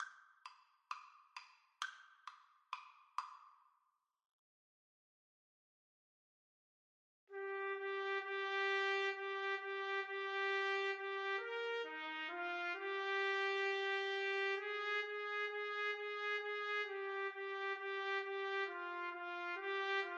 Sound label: jingle bells boogie trumpet duet